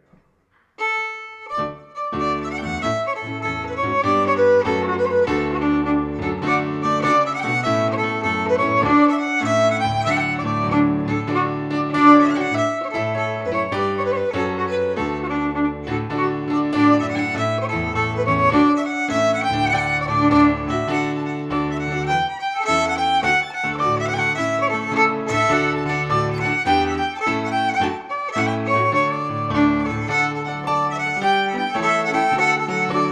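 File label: Jig, Scottish Fiddling; Morrison 1797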